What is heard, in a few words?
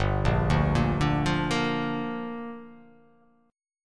G Minor 7th